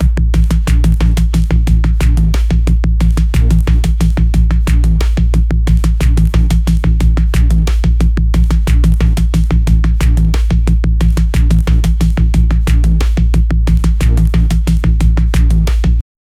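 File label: stylish energetic background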